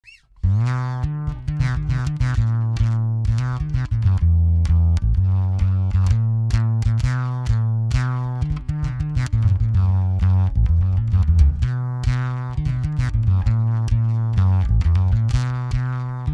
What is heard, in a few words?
Godin Fretless High Mid High Boost Maximizer; BBE